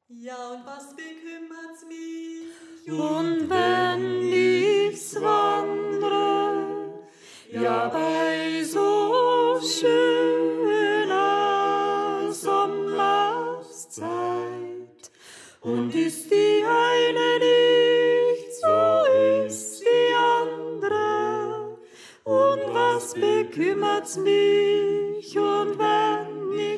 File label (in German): Sopran; Ja Und Was Bekümmerts Mich